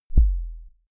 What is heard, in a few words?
sub kick clean